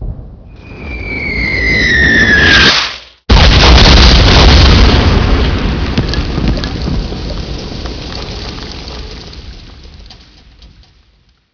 explosion bomb

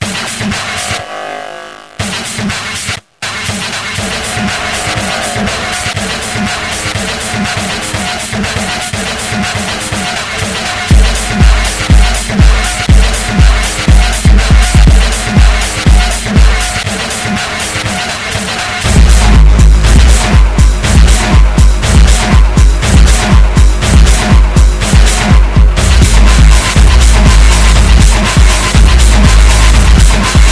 music

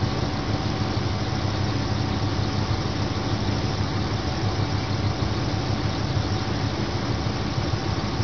fork loop; breeze

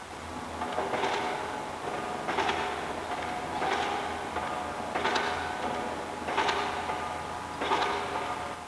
city constr

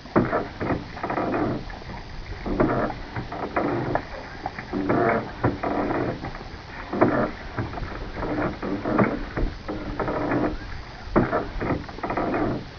boat; de pirates

